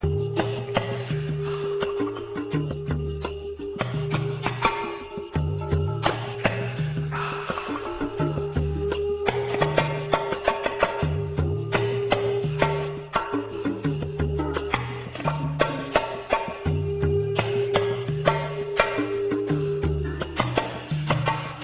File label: liquid calm